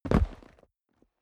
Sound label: step boots concrete jump; schritte